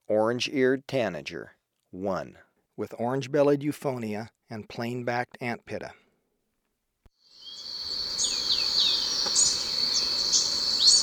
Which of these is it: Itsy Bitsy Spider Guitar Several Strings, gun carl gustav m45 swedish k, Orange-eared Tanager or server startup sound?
Orange-eared Tanager